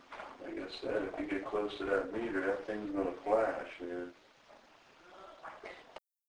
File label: Moan; pilesgrove residence